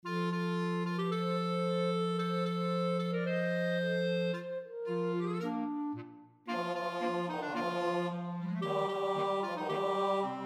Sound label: tango; dobrinescu ioan